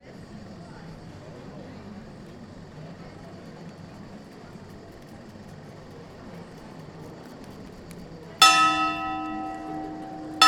ambience town trogir croatia tower clock strikes people in background; Tom Chapman